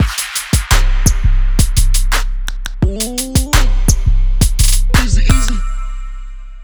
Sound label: HipHop Kit